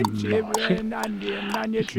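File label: Music Village Calm Inside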